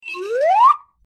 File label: slide whistle up